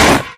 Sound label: robo step, footsteps; source engine; tf; mvm; video games